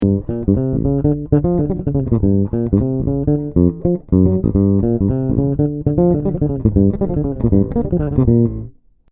bridge, bass; bassart relicmaster

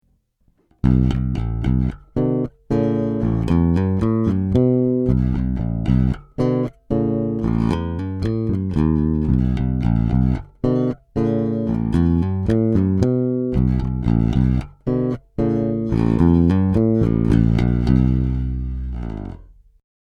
pu parallel, bass

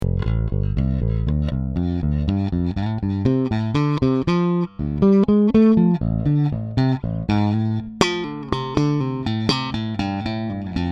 ibanez jtkb 300 steg hals pu; jetking

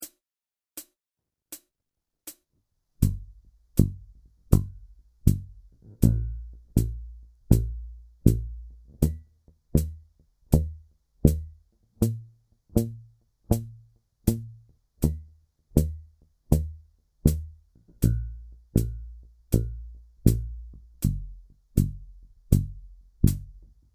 excercise, 4 finger; achim seifert

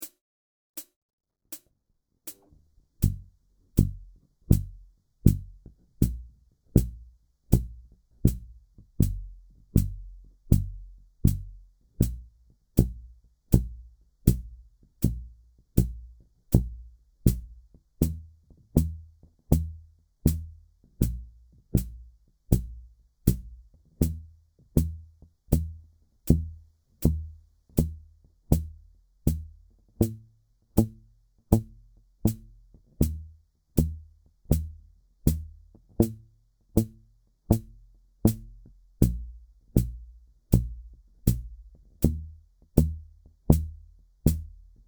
excercise, 4 finger; achim seifert

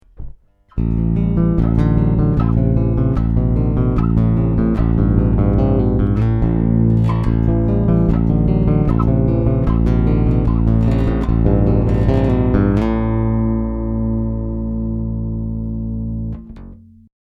piezo, bass

